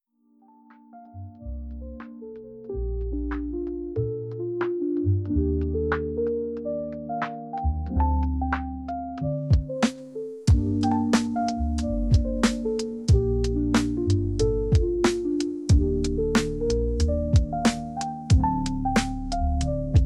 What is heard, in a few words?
Instrumental Rap Beats Chill Rap Beats